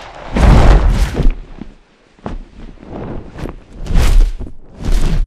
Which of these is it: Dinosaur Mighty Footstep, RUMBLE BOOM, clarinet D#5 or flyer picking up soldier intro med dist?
flyer picking up soldier intro med dist